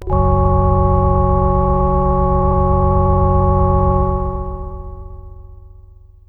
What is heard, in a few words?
Synth, Radio tone Chord